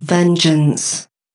Intro 130BPM Vocals

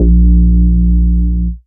Root A#, Ultimate Bass; Vengeance Samples

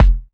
Soft Kick; Vengeance Samples

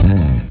Slides; Vengeance Samples; VEH1 FX

